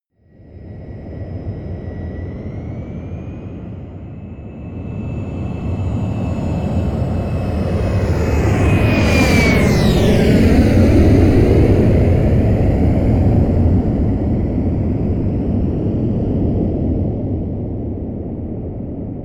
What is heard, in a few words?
plane boeing 737 flyby close